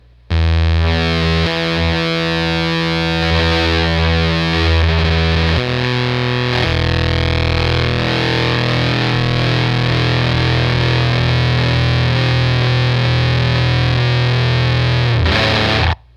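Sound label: Fuzztest guitar fuzzfactory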